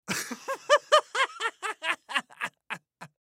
Laugh High Pitch Male Fifty Yrs; Laughter; Human; Blastwave

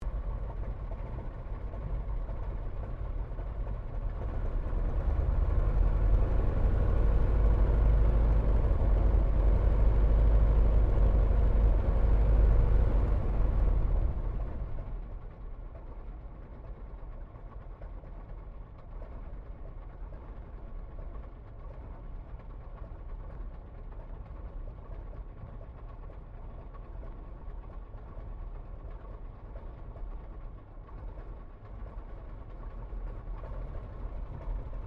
1916 SOPWITH PUP REPLICA TAXI INTERIOR; Replica of a 1916 Sopwith Pup; Airplanes; Vehicles

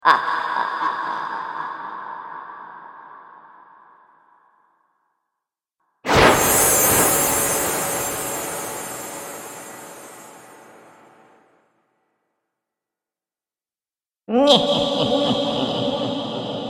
Horror Witches; Horror Pack; Magic Sound Effects

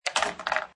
Billiard Pool Snooker Ball Falls In Pocket; Pool ball, snooker ball, billiards ball; Sports; LynneMusic

